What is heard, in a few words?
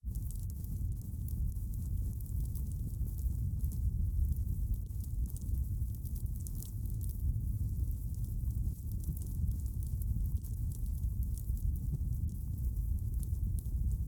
Fire burn Languid Fireplace Crack Roar Of Flame